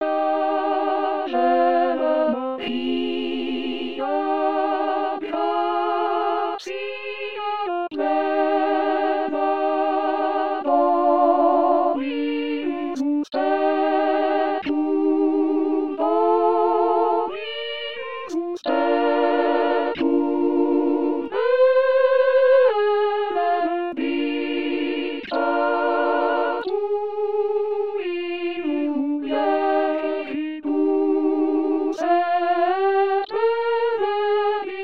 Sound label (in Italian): Coro